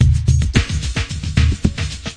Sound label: thick echoes, drum loops and breaks, 110 bpm; 101 to 110 bpm